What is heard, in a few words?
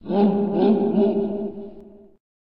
Small ambient dogs; STALZONE